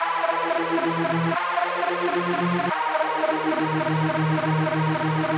evil pad; remixable collections

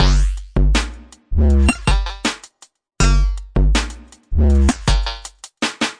080 bpm bendy hop, drum loops and breaks; supafly

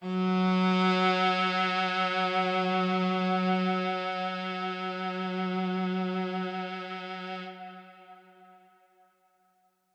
Cello Verb F sharp 2, Verby Solo Cello; PADS and STRINGS

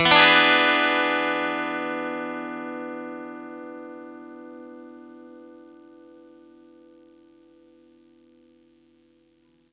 clean chord E7, clean electric guitar chords

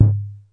Roland CR-8000, drum machine; drum machines; LOTM

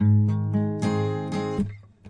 114 chord pluck strum; GUITAR LOOPS

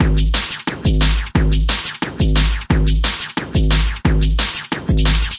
178 lubba dubby dnb, drum loops and breaks; 161 to 180 bpm

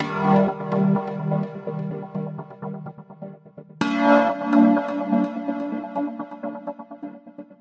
126 dissonant breathy minor chord stutters; SYNTH AND ELECTRONIC LOOPS

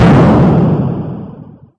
bang; VIDEO GAMES; rallyx

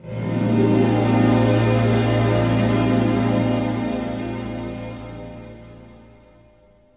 blissed out harp

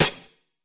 fx drum, Electro FX; UNUSUAL KITS and FX

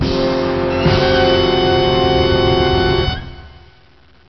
Terror Chord; Cheesy Lo-Fi Sound Effects

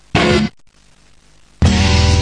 double horn hit, Horns and Brass

